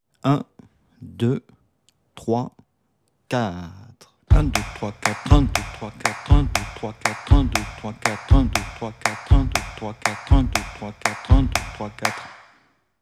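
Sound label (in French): methode pour travailler un rythme 060bpm, guitare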